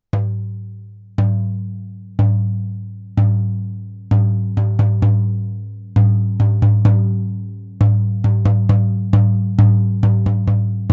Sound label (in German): gongtrommel